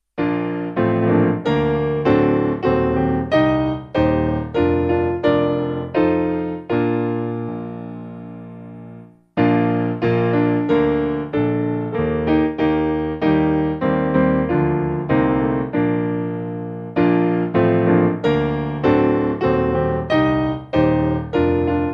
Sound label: Piano; Lucius